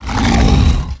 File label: aware